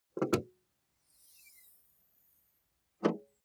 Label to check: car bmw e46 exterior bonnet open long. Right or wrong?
Right